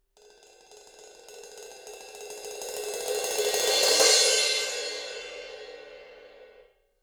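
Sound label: suspended cymbal roll; パーカッション; サンプリング素材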